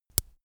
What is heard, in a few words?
metal watchstrap click